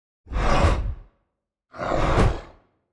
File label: Demon Minion Attack; TANK; 3Souls